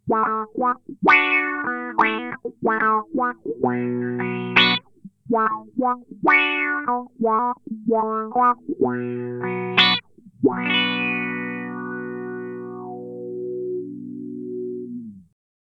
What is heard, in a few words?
Spatial Delivery V3 LP Up; Earthquaker Devices